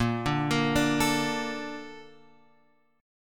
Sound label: A sharp Major 7th; A#M7 Chord {6 5 8 7 X 5} arpeggiated